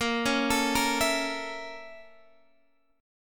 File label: A sharp Minor Major 7th Flat 5th, Guitar Half Step Down